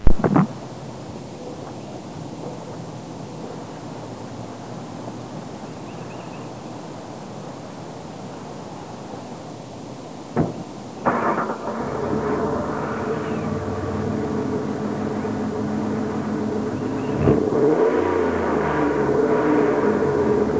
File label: start-up